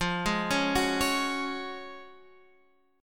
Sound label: Gb Major 7th Suspended 2nd F, chord